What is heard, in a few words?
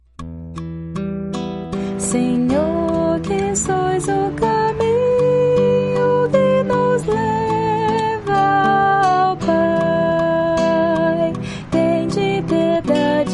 contralto